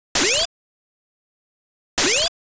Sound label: Own Normal Shot